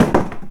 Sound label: cardboard hard; Dystopia; physics